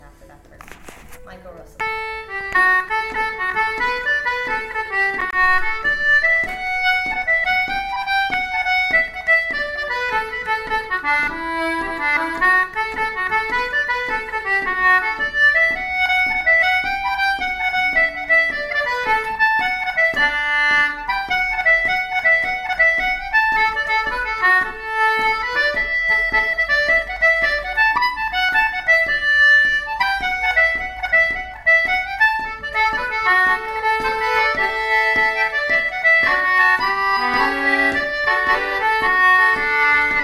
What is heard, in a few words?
jig